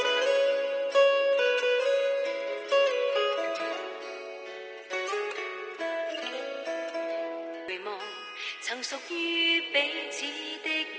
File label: music